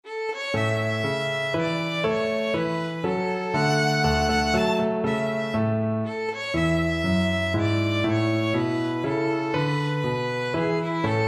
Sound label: German Dance, violin; K605